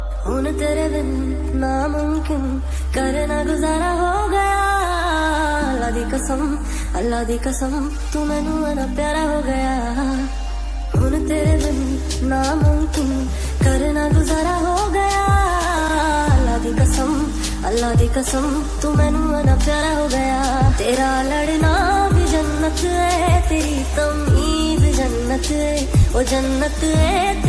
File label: cartoon song ringtone; tiktok